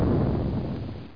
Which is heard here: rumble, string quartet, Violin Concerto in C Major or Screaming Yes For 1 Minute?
rumble